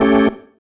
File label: Stab, Organ, One Shots